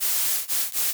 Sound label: spray can spray; HDSnekTechDiscountPartyPack